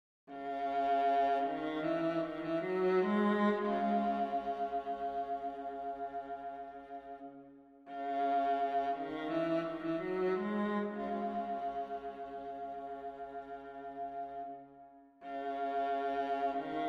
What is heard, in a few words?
viola solo